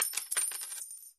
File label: money sound